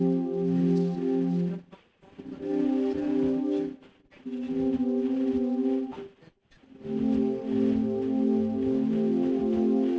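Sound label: with drums inst src; SiSEC08; SiSEC underdetermined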